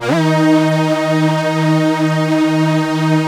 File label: D#2, LEAD SYNTH; Best Service Alpha Dance vol.2